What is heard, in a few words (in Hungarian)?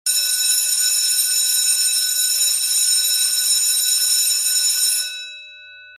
iskolacsengo